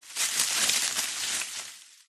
foley tree branch thick healthy leaves movement rustle short